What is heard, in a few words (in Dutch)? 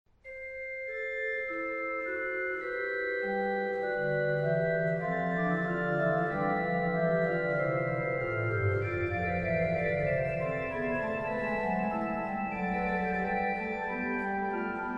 Orgelvers